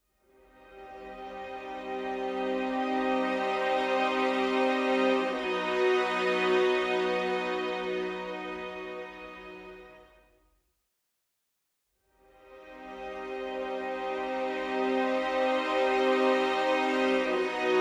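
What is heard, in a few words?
ambient, soundtrack; vendriens well; Tyranny Gold Edition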